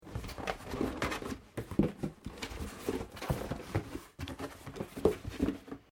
Cardboard box open; Material components for constructing more complex sounds; Materials

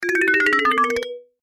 Cartoon Mallets Ascend; Descend Short; Cartoon Music; Cartoons; Blastwave

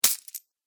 Coins Drop; Money

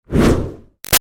Fire Torch Whoosh Fast Strong; Flame Sound Effects; Big Room Sound

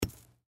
Bullet Shell; 44 Drop Sand; Warfare; Drops (Sound Effects:Objects); Blastwave

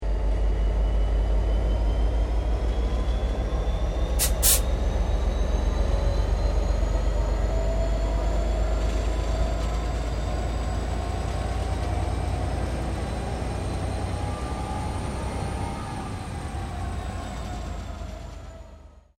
Train T Class Diesel Locomotive Valve Noise; VICRAIL; Various Melbourne Trains; Vehicles

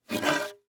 Wood Plank Up, Pick, Up, 2X6; Industry, Wood, Plank; Materials

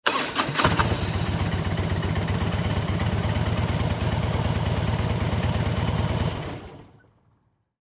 Motorcycle Start On Idle Off; Transportation Automobile; Blastwave

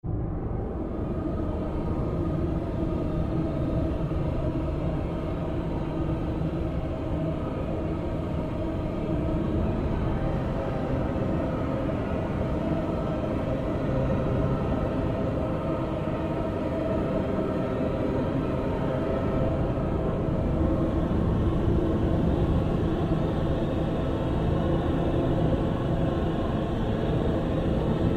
Orchestral Drone

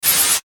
Static Filter Flange, Static Bright Harsh Burst; Production Element Imaging Element Accent Transition; Blastwave

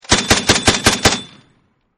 M2 Burst, M2 Machine Gun Burst; Machine Guns; VRSonic

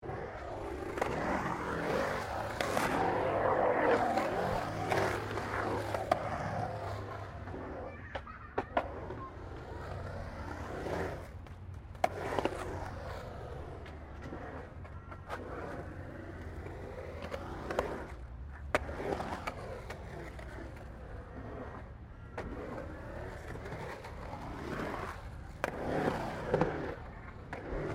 SKATEBOARD SKATE BOWL ROUND BOWL LONG; Skateboard sounds; Skateboarding; Sports

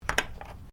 Nail Clippers Place Bench; Miscellaneous Human; Sound Effects:Human; Foundation3

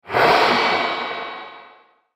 Metal Chamber Resonance; horror sound effect

